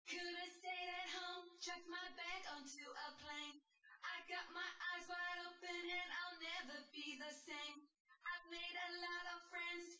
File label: wdrums synthconv 250ms 1m